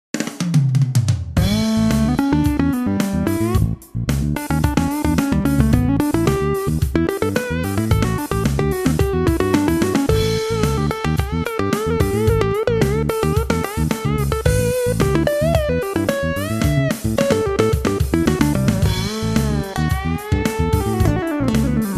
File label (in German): Synthsolo; Komplett